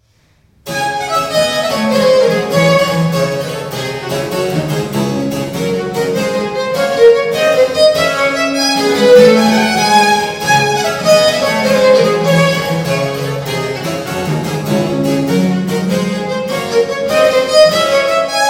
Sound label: Gigue; Rebel